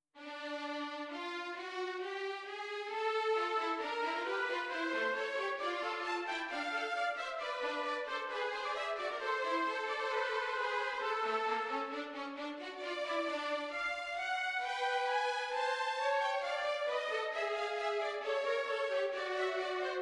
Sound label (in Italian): Orchestrazione; Ronge; Gerd Prengel